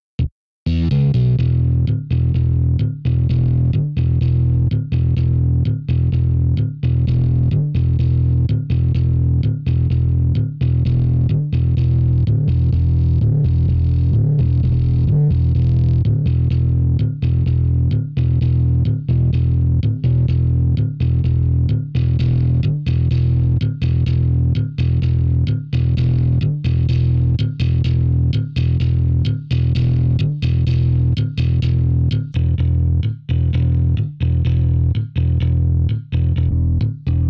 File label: VIRTUAL BASSIST 2 ROWDY